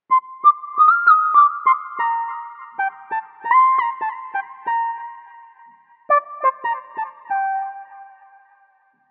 Lofi Melody Loop - 90 BPM G Min Lead; Melodics; Loops; Loop Stems; Cymatics; Eternity Sample Pack

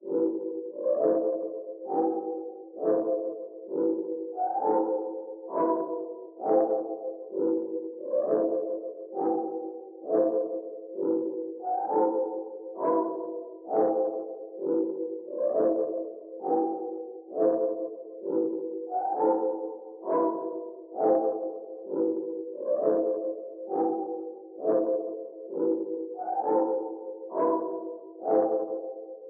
132 BPM E Min Pad, loop stems; Cymatics; 2022 Melody Collection; Hero

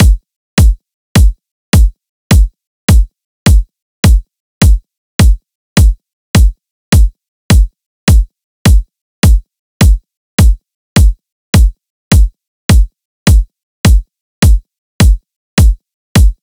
Drum Loop 104 BPM Kick, Midtempo; Blossom; Cymatics; ANALOG EVOLUTION Beta

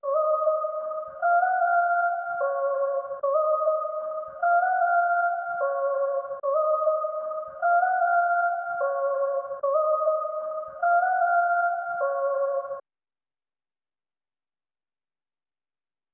150 BPM D# Min Choir, loop stems; Melody; Cymatics; 2022 Melody Collection; Andromeda

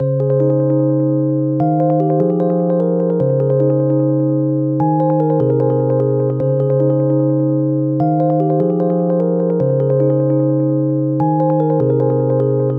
150 BPM F Min Plucks; Cymatics; Alive

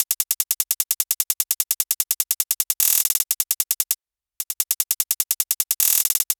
Future Bass Kit 150bpm Hihats; Drums; EDM Drums; Drum Kits; Stems; Ghosthack; Ultimate EDM Drums; Drum Mega Bundle; Future Bass Kit 17

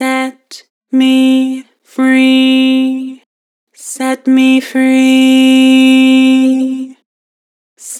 Vocals; Harmor

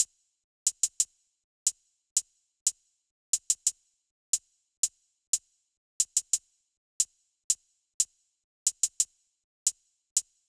Drum Loop - 90 BPM Hihat; Loop Stems; Cymatics; Mystery Pack Vol 4; Pillow Talk

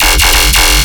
Bass Hit F, Dubstep; Necrotic Surge; Ghosthack; Demolition

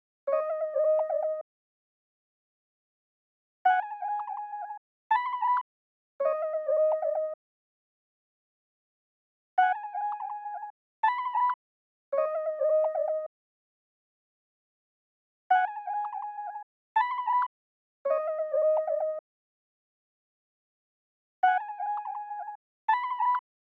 162 BPM C# Min Lead, Loop Stems; Melody; Melodies; Cymatics; 2022 Melody Mystery Expansion; Feelings

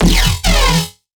Riddim Bass Hit E Chainsaw; One-Shots; Bass Hits; Ghosthack; Riddim Essentials Volume 2

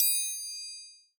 Triangle; Cymatics; Emperor World Percussion (Teaser)

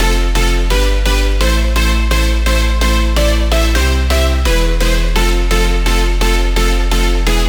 House Drop Loop Progressive House 128 BPM A Min; Synths; Supersaw

